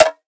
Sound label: drum perc wide rattle; Virtual Riot; Drum Hits